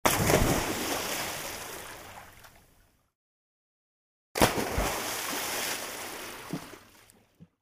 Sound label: Jump into the water